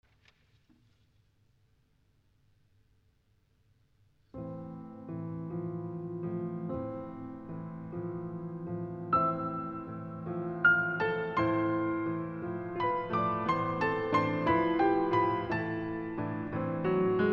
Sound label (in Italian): Siciliana